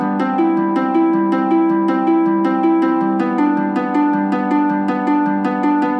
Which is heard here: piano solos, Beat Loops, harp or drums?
harp